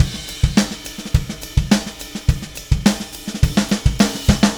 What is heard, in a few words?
Beat Fill; Sonic Foundry (Sony Creative Software); Indie Rock Anthems; Session 02 - Clinical